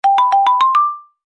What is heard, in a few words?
Iphone 8 Note